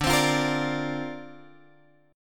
D-Minor 7th Sharp 5th D Guitar Drop D, chord

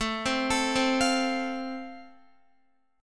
A Minor Sharp 5th, Guitar; 4F,4A,5C,5G,5C,6E; arpeggiated; JGuitar

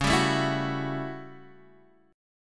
A 13th Db, guitar chord

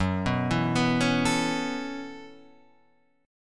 B 7th Suspended 2nd Suspended 4th, chord

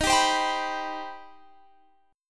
C Minor Major 7th D sharp

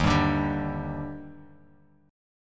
Eb Major 7th, Bass Standard; EbM7; strummed; JGuitar

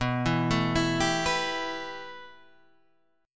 B 7th Suspended 4th Sharp 5th B down; B7sus4#5 arpeggiated; chord shape; JGuitar

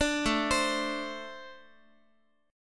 A-Minor Double Flat 5th A Guitar; arpeggiated; JGuitar

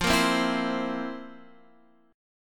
C 7th F, C7/F; strummed

